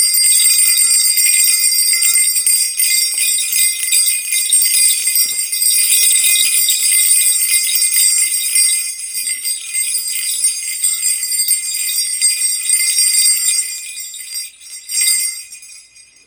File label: red leather bell